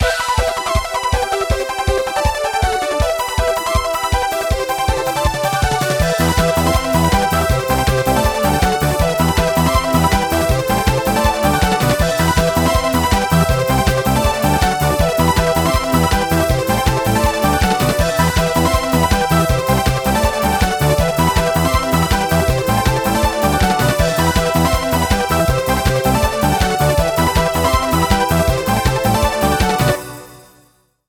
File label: Techno